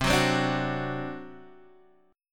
C Suspended 2nd Flat 5th C down Guitar Half Step Down